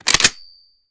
reload empty quick charge; gmod; viper

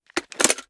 inspect drum mag out; reloads; gmod

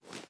sm uzulu reload xxmag fast arm; gmod; iw8

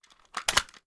ar reload mag out, weapons; gmod; viper

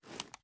sm uzulu reload empty rattle; reloads; iw8; gmod

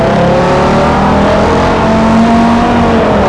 second, vehicles; gmod; tdmcars; dodge ram

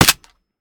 fire last mech; weapons; gmod; viper

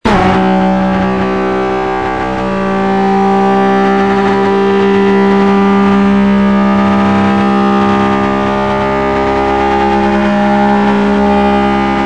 4th cruise, vehicles; enzo

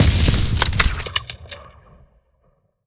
shotgun fire; gmod; viper

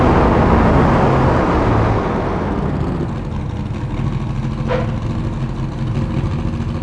throttle off, vehicles; gmod; tdmcars; dodge ram

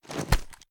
reload empty waffle end; reloads; gmod; iw8

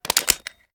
reload empty magout; gmod

